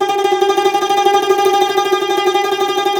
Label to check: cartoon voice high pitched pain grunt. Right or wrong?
Wrong